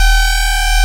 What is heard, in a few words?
Aud Edge G5; Digital Sound Factory Xtreme Lead